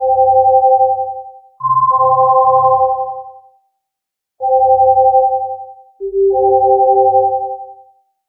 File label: Helium; ZTE; Majesty; alarms